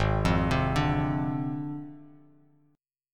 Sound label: Ab 6th Flat 5th Ab, Bass Standard; arpeggiated; JGuitar